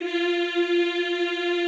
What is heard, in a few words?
EEH F3, Classical Choir; Best Service; Extended Classical Choir